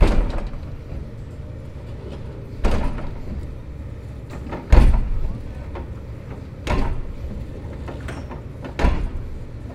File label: Demolish Old Building